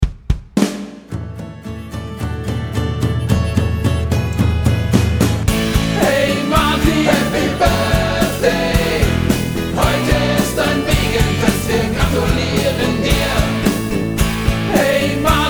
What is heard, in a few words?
Happy Birthday Martin